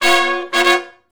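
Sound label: R&B Riff (Db) harmony, Horncraft; Crimson Blue and Fabulous; Sony Creative Software; Horncraft 4 RnB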